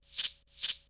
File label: shaker, drums; CMP - Analogon